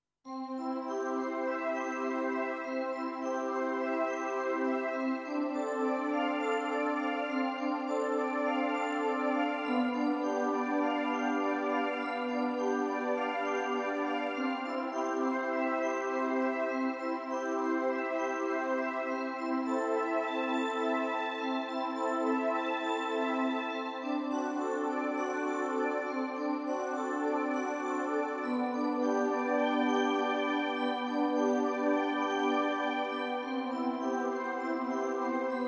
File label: Synth Pad